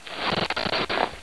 crawl

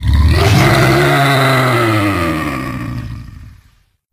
pig alert; dn3d r; pigcop

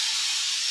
steam jet

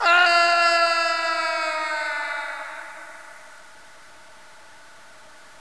gone

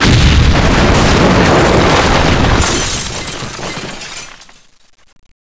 expl large

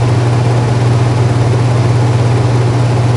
ventilation loop

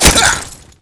allied die; dod

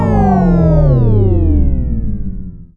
reactor off

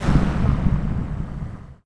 power off

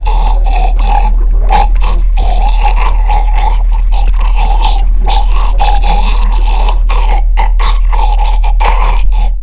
mouth idle

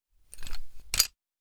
fidget, grenade

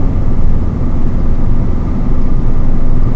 underground; ambience

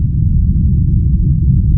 ambience

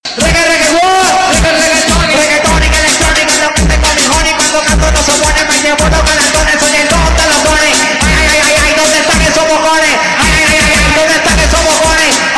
tonic

